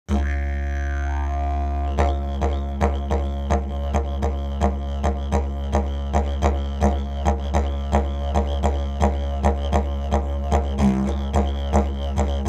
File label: didgeridoo; didgeridoo spielen lernen